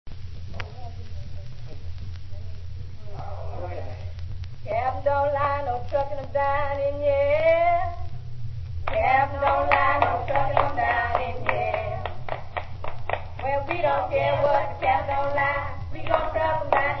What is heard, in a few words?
clapping song; capn dont low no truckin; lomax